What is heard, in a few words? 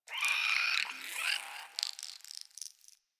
Venus Landscape Close Texture; Ambience; Warframe; Venus Open World